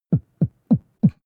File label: potion small drink